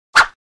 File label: Swish Single; Crashes Impacts